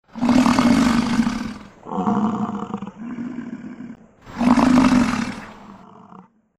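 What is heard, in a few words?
bison voice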